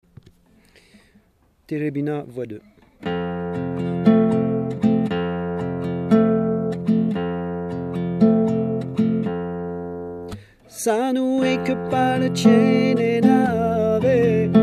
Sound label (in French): Voix